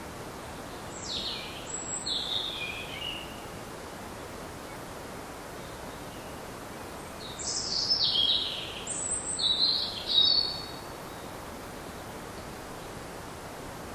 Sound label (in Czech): Cervenka Obecna; poznvme bory